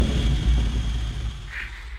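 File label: Music Volcano Light 120; from dust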